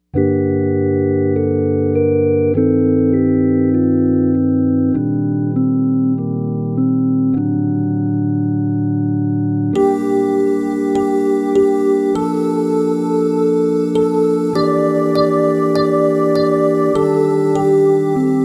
Organ Study

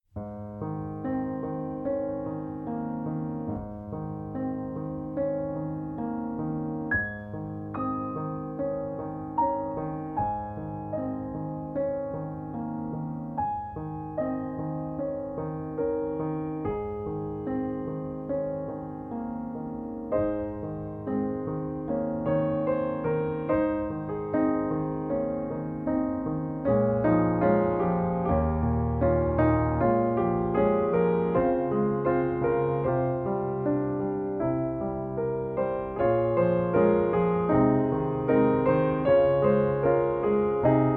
Communion Hymn Instrumental

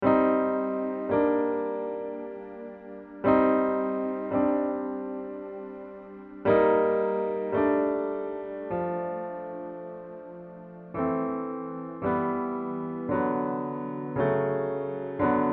Acc Med

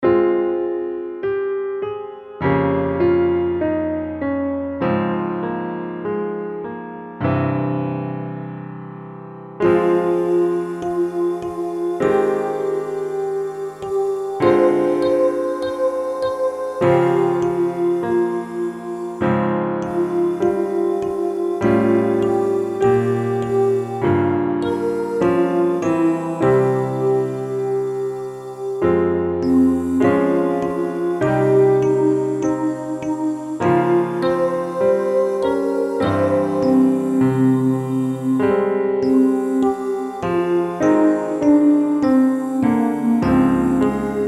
PIANO Study LOW

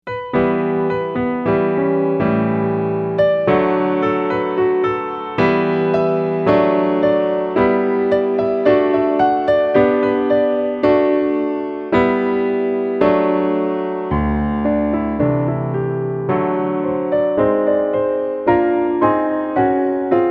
Piano Acc

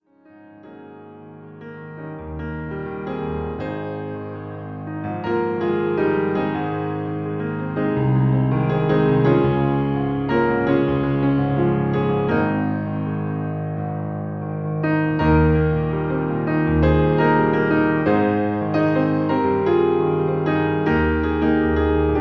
Solo Piano; I Need Thee Every Hour

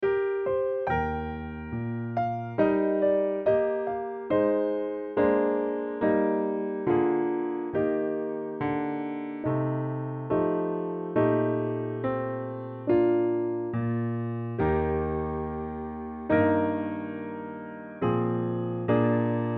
Piano Acc Eb HIGH

